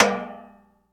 wall metal; firefight

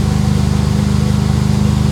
Humvee Loop; Trucks

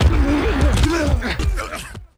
Football Hit Tackle

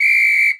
Hockey Referee Whistle; Hockey Horns & Buzzers

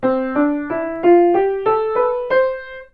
Piano Play Scale Up; SFX Kit; Foley - Misc

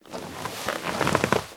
chair Stand Slow; Apotheorasis

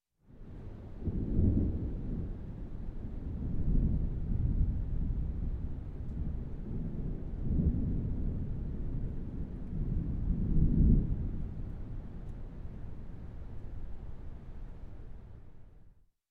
Thunder Rumbling In Distance; Sound Ideas 2000 Series

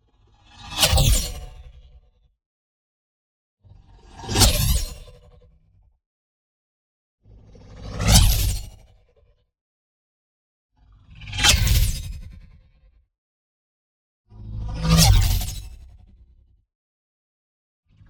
NEON WHOOSH LARGE FAST Speed Of Light; boom library

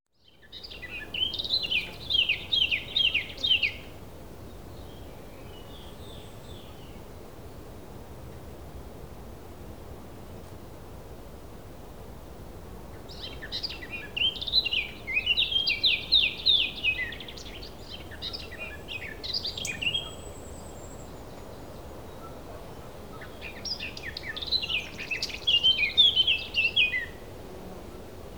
Birds single thrush; Birds, Insects & Animals

